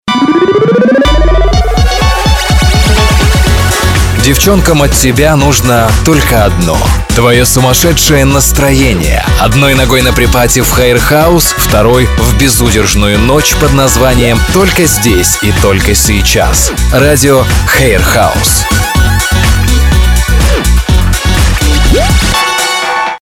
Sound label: Jingl; Hair House